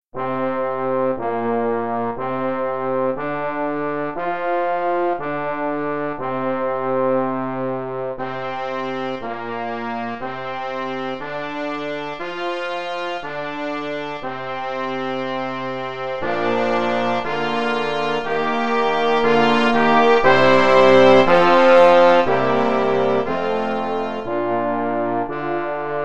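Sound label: Trumpets, Horn, Baritone, Trombone; brass quintet; Kimigayo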